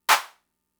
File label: Clap, Fricke MFB-522, Drum Machines